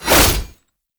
Naginata Whoosh, Weapons Melee

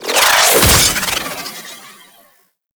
Parazon Hack In

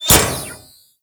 Kunai Impact Default; Warframe; Hit Effects; weapons

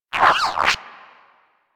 Mine Osprey Spotted, Dialog

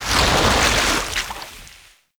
Aura Ink Explosion; Gameplay; Warframe; Shadowgrapher

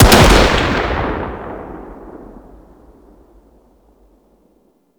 Sniper Shot; Warframe; Tenno

